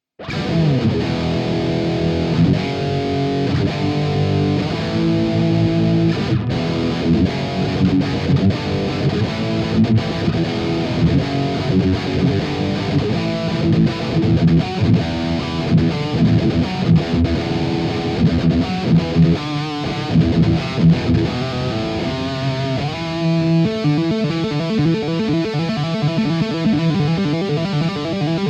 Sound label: Les Paul High Gain